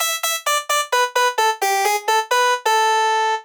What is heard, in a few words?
Sustaining Steel, Acoustic Guitar